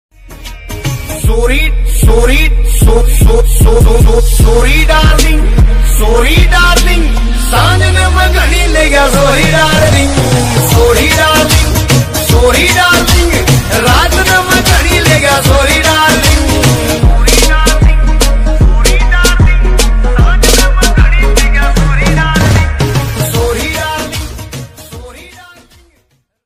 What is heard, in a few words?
love ringtone, song ringtone